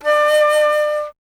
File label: Alto Flute